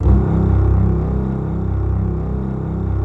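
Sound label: C BASS C1 left; SOLO C. BASS; Greytsounds VOL-1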